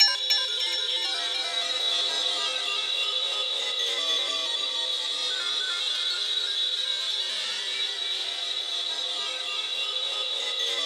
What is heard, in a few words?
EL. CHIME, ELECTRO CHIME, Yamaha VP-1